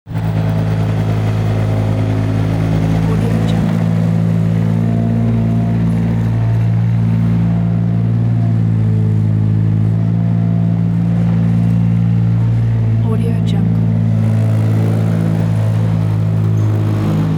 transportation tractor mini tractor drive

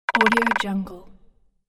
woodpecker close isolated sound

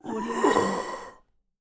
horror ghoul monster moan

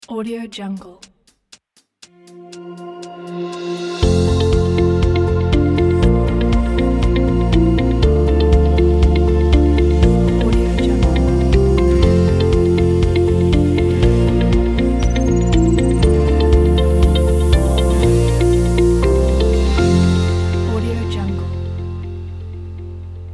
beautiful ambient logo

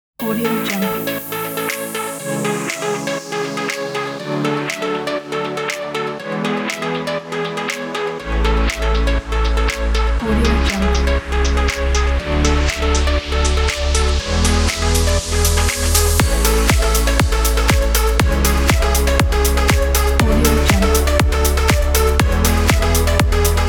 positive house

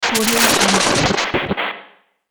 time for a glitch

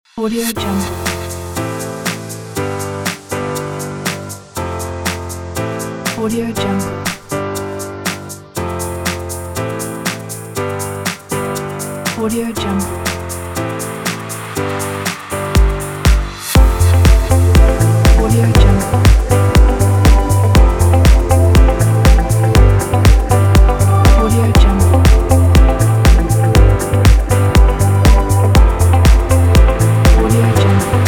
inspiring corporate background

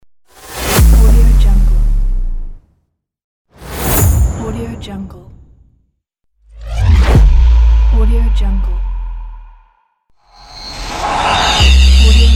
epic bass cinematic riser

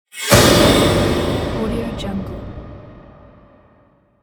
horror metal jump scare; sfx laboratory

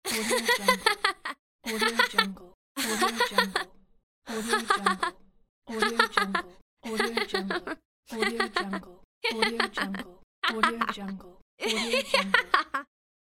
female short laugh pack

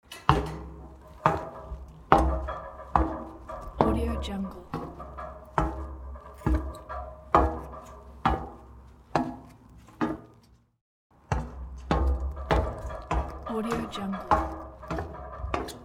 walking down metal stairs

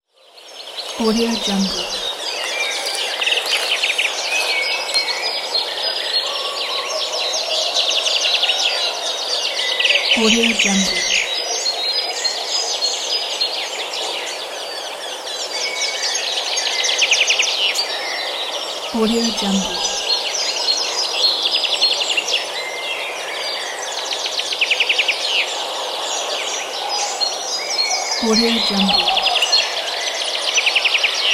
forest ambience

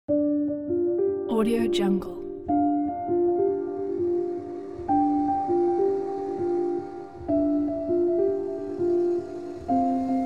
sadness cinematic

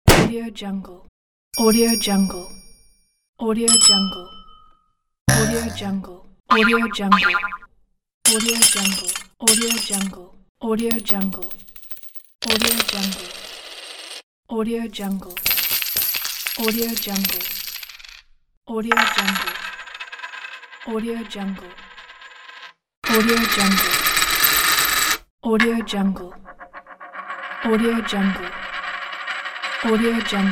infographic sound effect pack

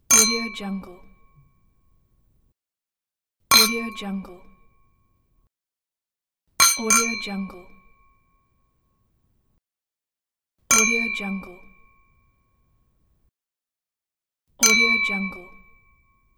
clinking glasses; soundfood

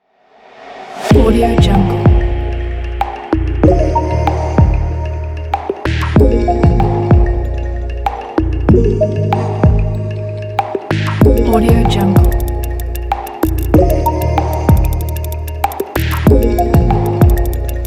stylish fashion high tech inspiration

